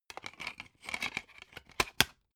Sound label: dvd case put in dvd